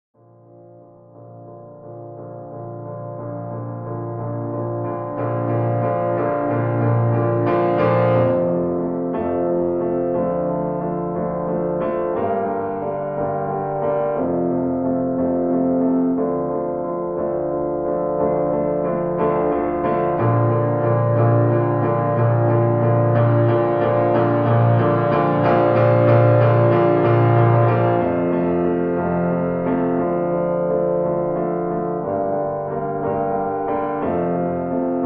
Pounded Piano; Cinematic

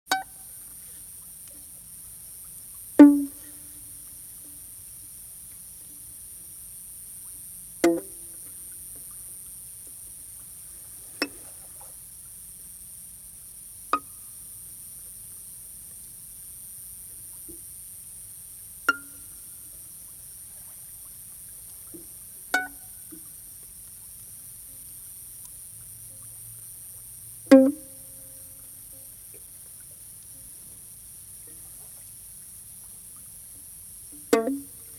Pizz Violin At A Bay With An Extrodinary Ambiance

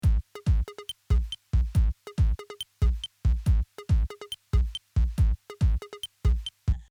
beat sature; Loop Mania Sampler Pack

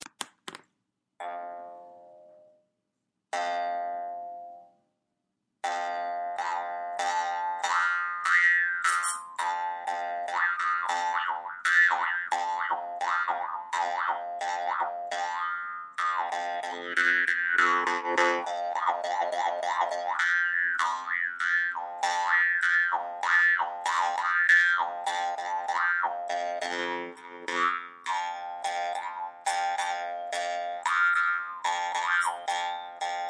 Jaw harp solo

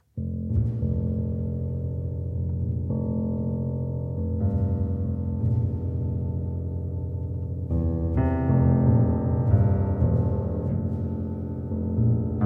Piano Phase

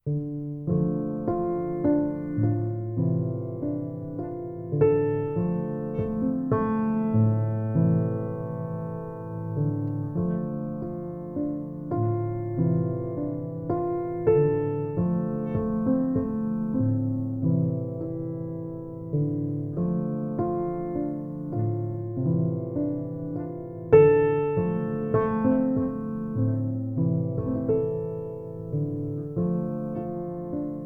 Surface Tension, Piano

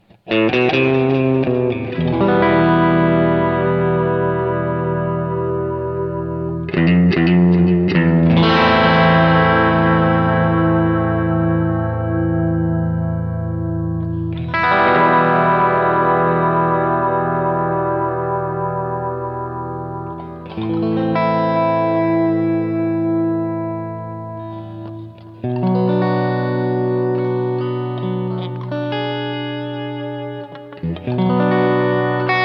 noir guitar; Stevies Amp Shack

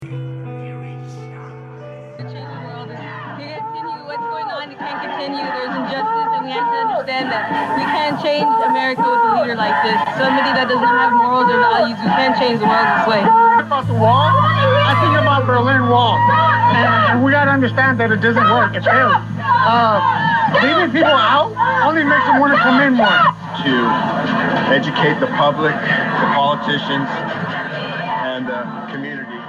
white noise

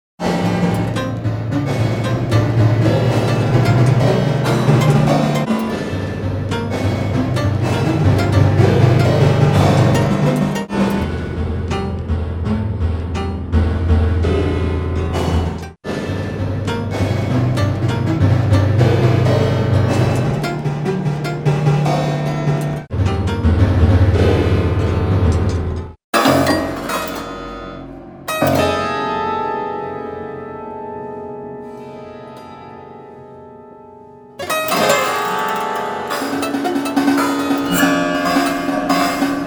Prepared Piano Piece IV

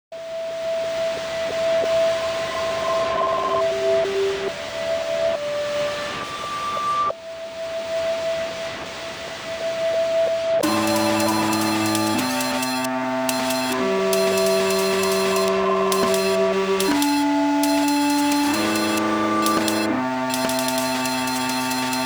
religious morning with birds

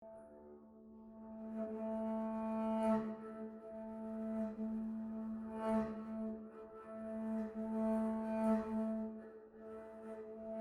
Prepared Double Bass

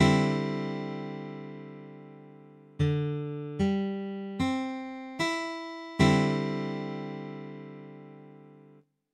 CD, Guitar Chords

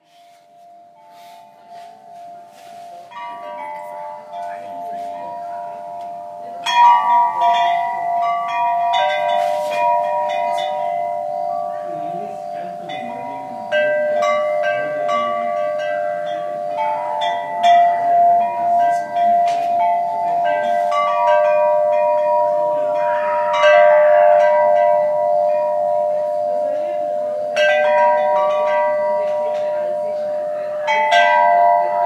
Wind Chimes in Palm Meadows Bangalore; Environments; Samuel Corwin; Selected Field Recordings from India and Nepal

